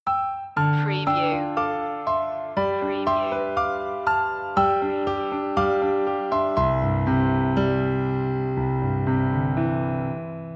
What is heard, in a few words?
o come all ye faithful piano loop intro; media music now